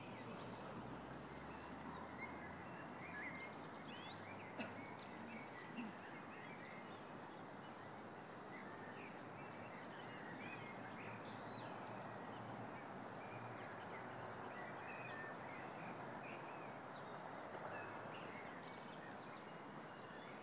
Vogelgezwitscher am Morgen

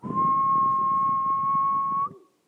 pfiff

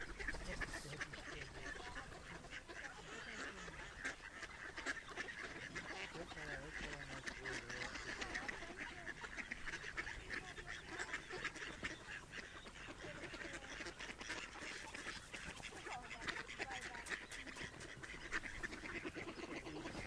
scharmützelsee enten geplapper

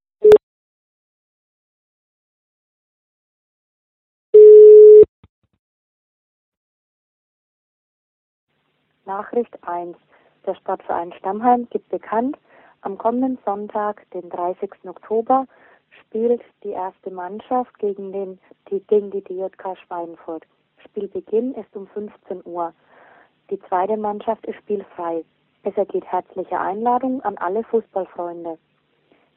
Rufanlage; stammheim

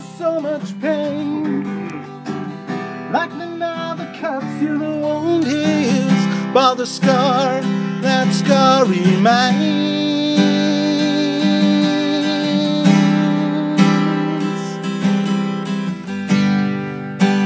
beim bbq gesang gitarre

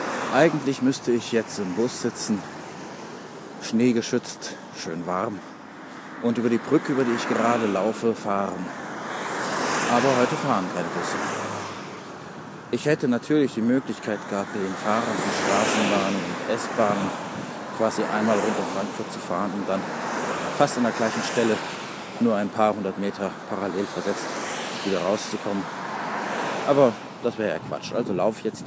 kurzer belangloser von autos fast ubertonter boo

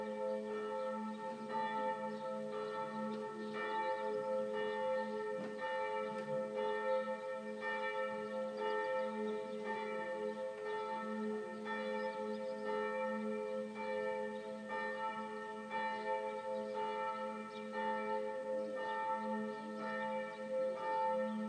Glocken am Karfreitag um 19 Uhr

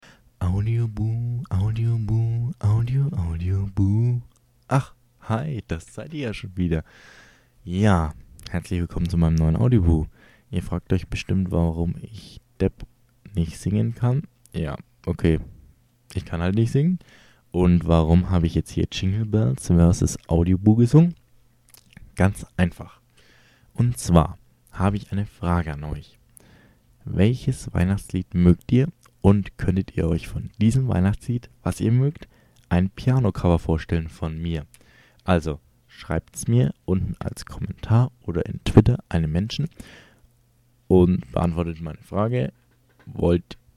weihnachstlied piano cover

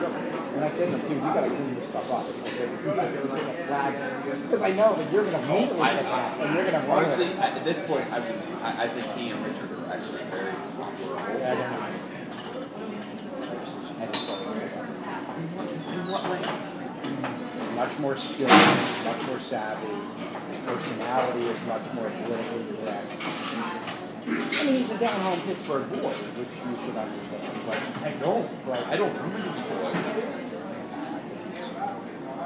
countryboy musik

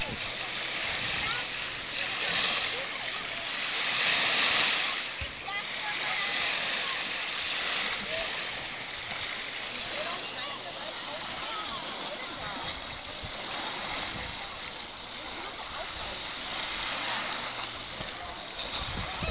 Wellenrauschen

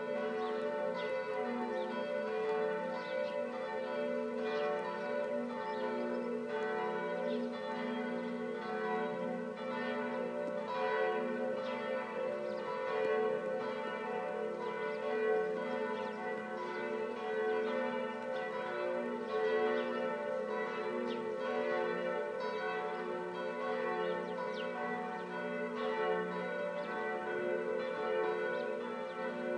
Palmsonntag Glockengeläut in Zeilitzheim

